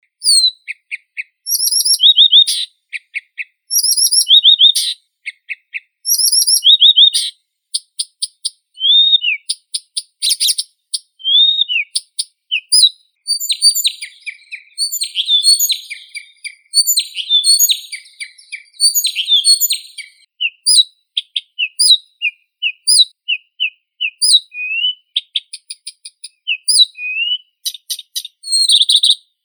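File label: blyth's reed warbler, acrocephalus dumetorum; kamishovka sadovaya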